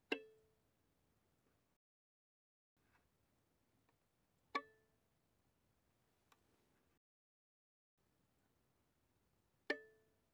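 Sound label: Violin pizzicato pianissimo sul G C5 Gb5; Strings; violin2012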